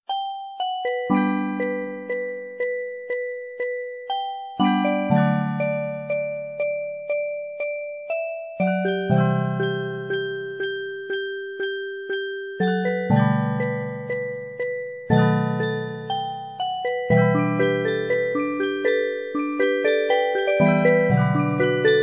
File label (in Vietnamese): nhac chuong piano khong loi